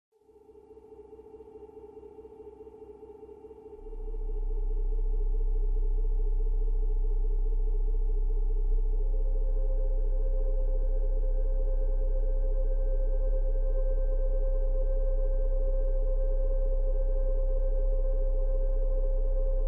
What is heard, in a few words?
soundscape horror; dragan maon soundscape horror love me by karrakula